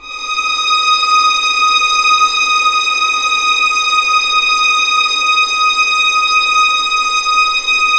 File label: D#6 LEG FVL L, The Ultimate String Collection; East West